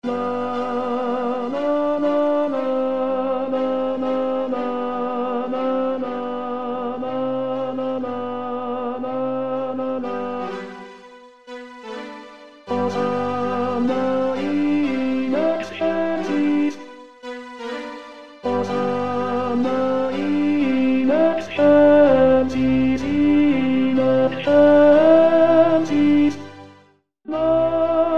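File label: bened Tenor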